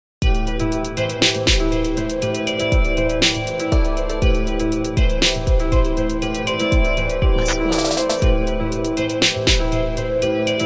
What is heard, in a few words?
advertisements